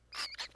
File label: Shriek, rat